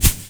run grass; Aragami

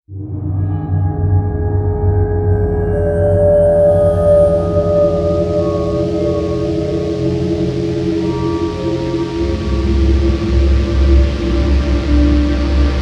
Forge Ambience; yaiba ninja gaiden z soundtrack

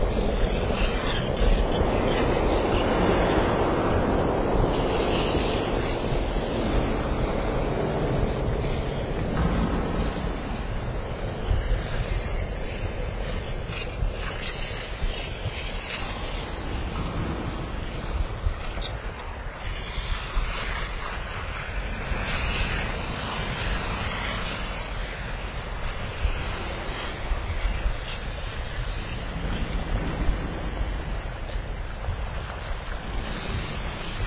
Lava Pit Ambience; Rumble Stars Soccer; android ios mobile gamerip 2019

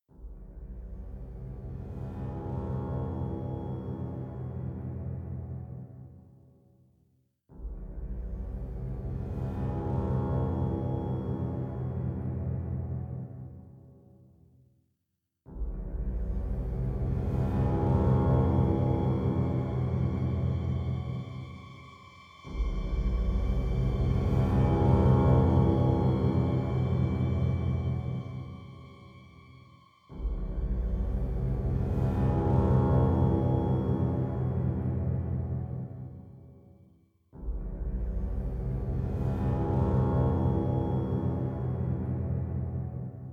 Default Loading Music

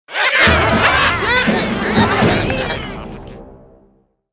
level start military

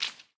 water footstep

